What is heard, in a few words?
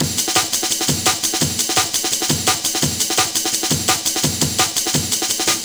Kickin Break, Drum & Bass, Beats & Breaks